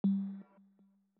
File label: menu move